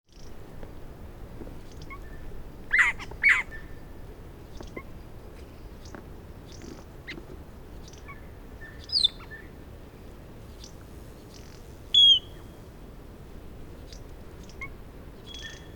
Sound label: Sphecotheres Vieilloti; Australasian Figbird; Call